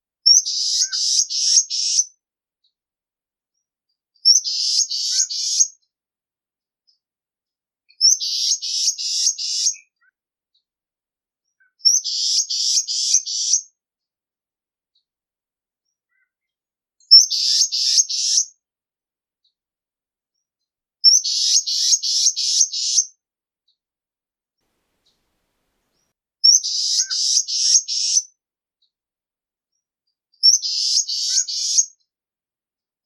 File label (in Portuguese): canto da choquinha da serra